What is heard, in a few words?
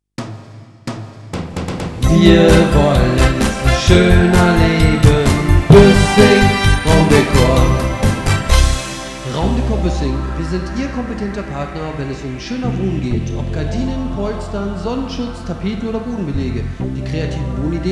jingle